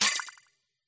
robotic game item sci fi military robotic robot cyber futuristic